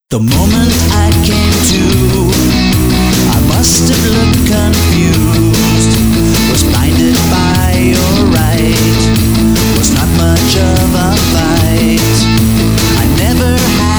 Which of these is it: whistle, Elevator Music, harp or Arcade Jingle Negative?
Elevator Music